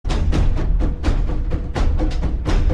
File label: drum; Jumanji